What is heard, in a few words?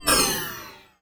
Sampled Hall, Impulse Responses, Warped Reverb; Medium Spaces; 1.0s; Apple